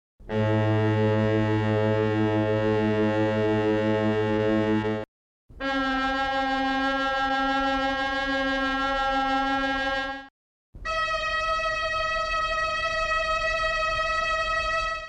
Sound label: kinura